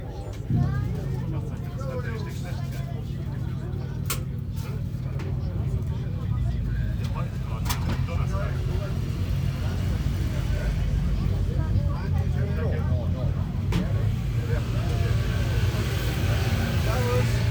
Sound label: Stelvio Atmos